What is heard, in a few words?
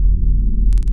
bfg hum, weapons; quake2